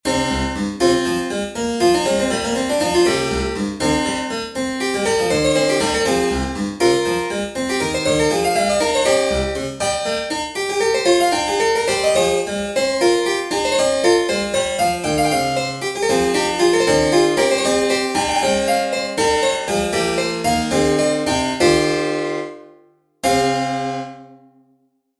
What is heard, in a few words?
Diasem Lydian Example 24edo